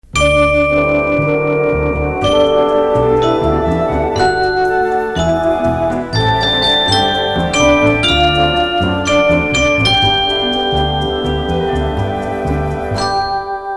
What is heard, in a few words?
Bell Signature, signature played instrumentally following theme; WKLO